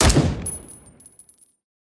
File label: mcx spear fire, weapons